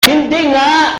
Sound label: Hindi Ringtone